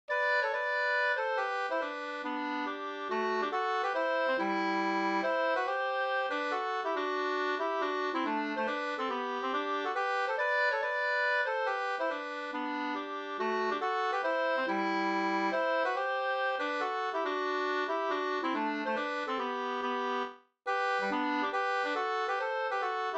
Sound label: mazurka; Krocklesas mazurka Vg 2st